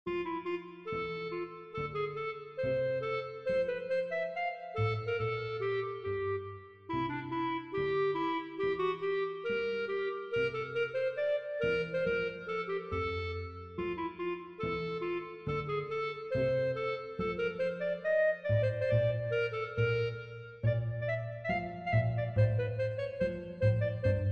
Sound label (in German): Marsch